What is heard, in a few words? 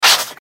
Chili Slide; Cookie Run